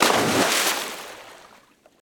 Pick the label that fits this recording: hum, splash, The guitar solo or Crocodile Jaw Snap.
splash